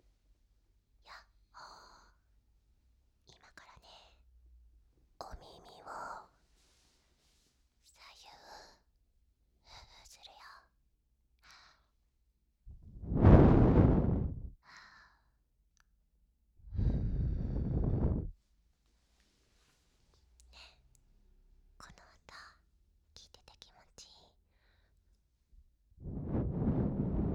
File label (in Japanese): お耳フーフー, バイノーラル; オナニー実演